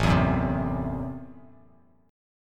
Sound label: A Minor 6th A, Chord; Am6 strummed; 5,7,4,5; JGuitar